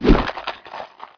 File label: zombie swing